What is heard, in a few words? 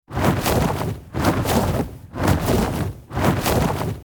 Pterodactyl dinosaur flying flapping wings